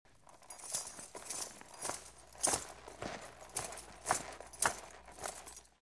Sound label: Rustle of clothes when walking, jingle of keys in a pocket; steps